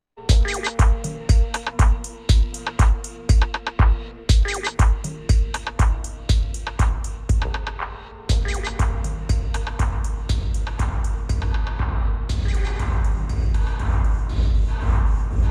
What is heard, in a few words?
Hall Dry to wet; Mixxx V2; BW Damping